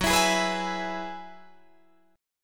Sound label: Gb 9th Suspended 4th Gb, Guitar Drop D; chordshape; down